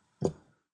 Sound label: Pick Up Glss, Glass picked up from table.; Part of Glasses (Sound Effects:Bars)